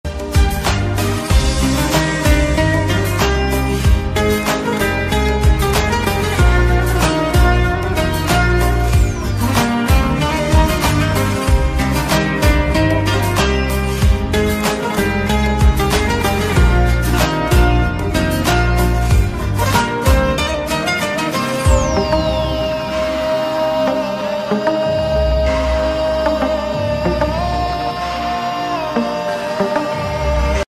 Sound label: sad ringtone background music rimix